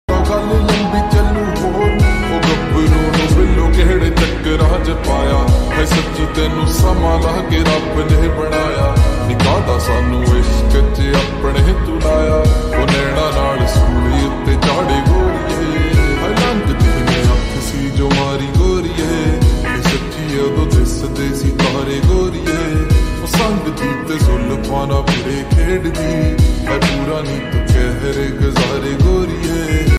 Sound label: lofi ringtone slowed reverb